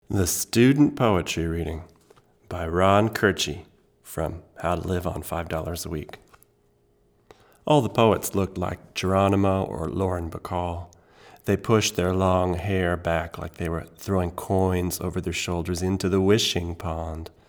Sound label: English; AMI KM100f